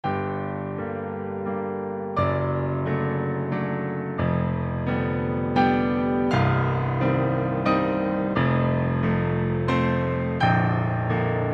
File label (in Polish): walc g moll szybciej